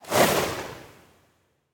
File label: umbrella throw fire; smite; vamana